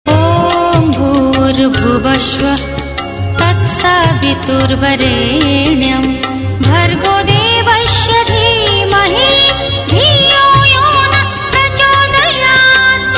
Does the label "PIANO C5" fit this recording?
No